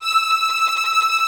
VI TREM FE5-L; AKAI Advance Orchestra Upgrade 97 Vol.1

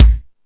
Lotsa Kicks, Drum Machines

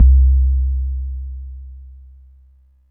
sub-stained, bass; Jungle

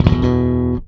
Slap One Shot EB, Bass; Bass X

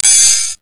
HRD 130 RID, Big Beat Drumloops; USB Soundscan vol.25; AKAI; HARD 130

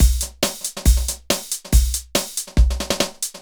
Synth Loop BPM140

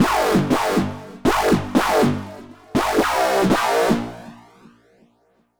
Wow Bass; Dr. Fink's Funk Factory

